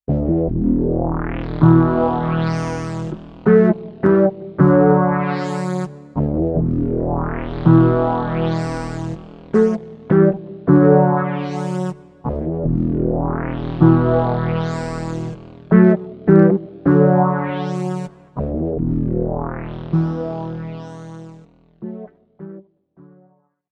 space

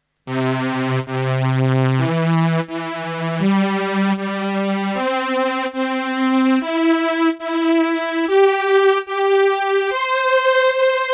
VP330 VC340 Strings

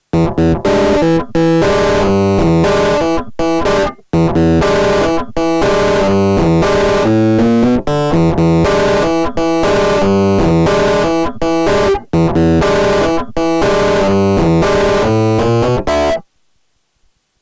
MOD Devices MOD DUO X Epiano MOD DS-1

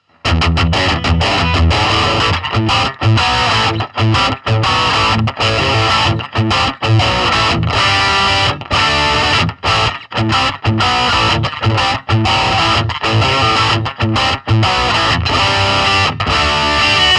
Red Channel Lead Tone 1

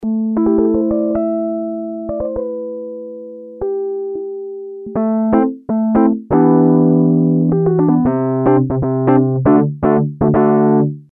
lounge wurlitzer

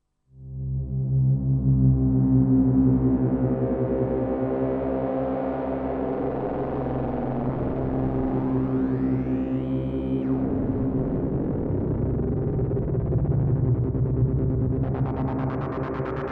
Robot Destruction

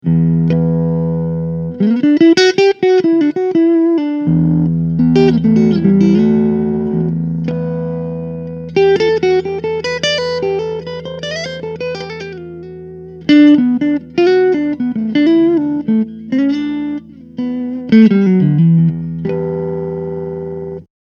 Clean

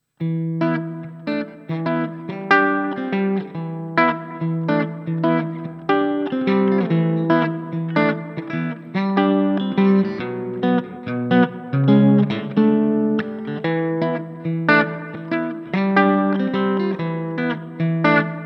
Twang

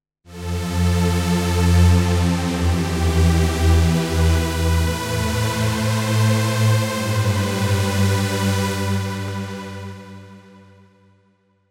JP-8000 Pad

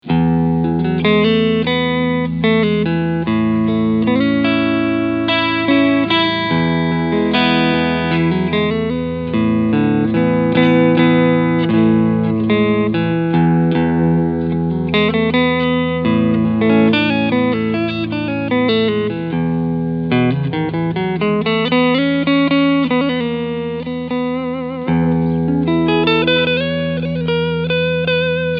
Front PU Clean

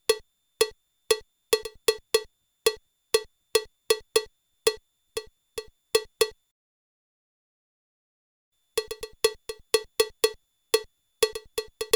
Cowbell Tamb; drumport

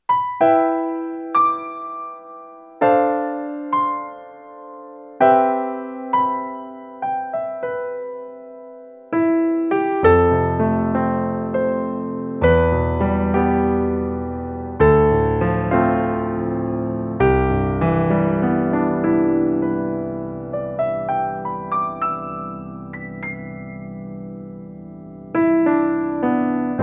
Korg B2 Piano

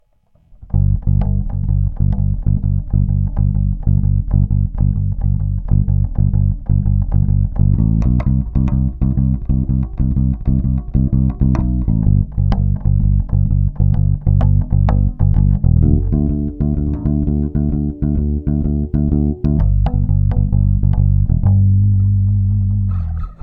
EC 1 Bass Original; Cranborne

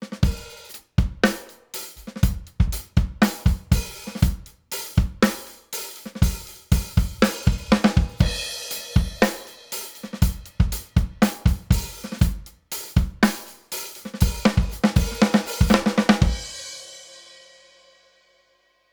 Drums Fast; Rockruepel Comp.Two V2

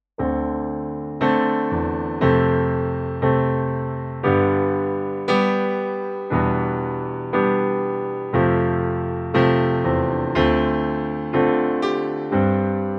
Piano; Kurzweil KaE1

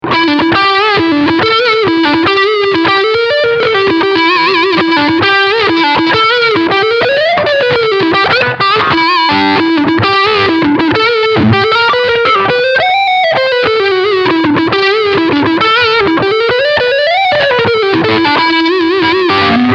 Leadline Crunch Front

